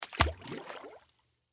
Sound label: Water Splash Submerge Toy Bathtub; Splash Sound Effects; Splashes; Objects; Blastwave